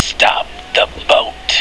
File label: Boat